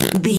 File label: fart squirt